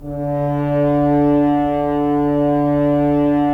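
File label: Solo French Horn, Brass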